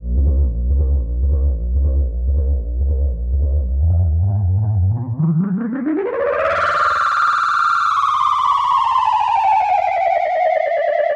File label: Feed chorused, Feedback FX; 99Sounds; Dark Engine